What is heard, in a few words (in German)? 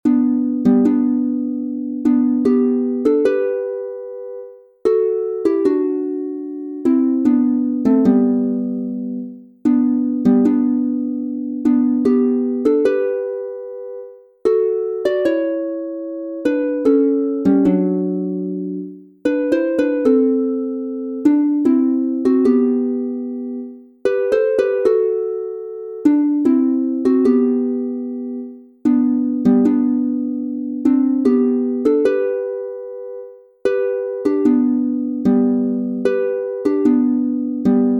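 G Dur 2 stimmig, Harfe; Kuechen und Baenkellieder; In der Fern´ da ragt ein Haus Der Mutter Segen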